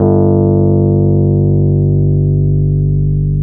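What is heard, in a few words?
Fender key bass G1; Sonic Reality; Vintage Timetraveler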